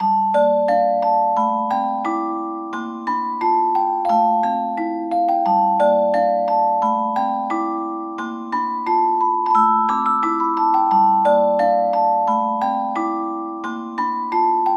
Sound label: music box